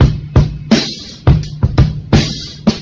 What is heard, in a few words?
how weird you are, Drum loops Boombap